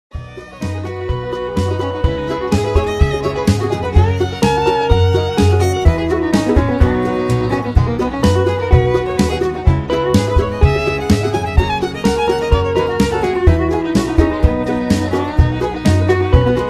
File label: Key of B